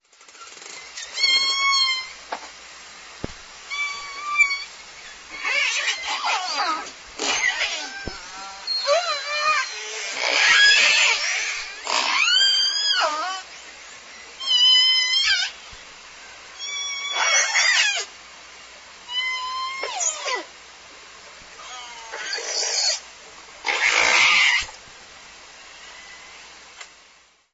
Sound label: call examples, SRKW; orcasite